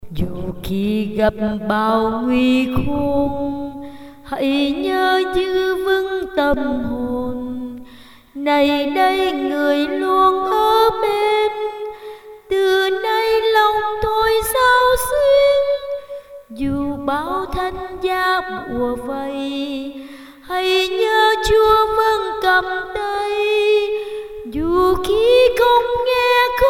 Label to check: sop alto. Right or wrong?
Right